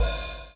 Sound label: BLOW; Spectrasonics - Backbeat